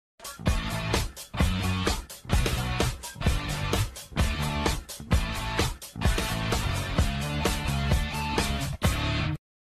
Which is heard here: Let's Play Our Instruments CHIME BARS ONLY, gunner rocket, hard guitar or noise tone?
hard guitar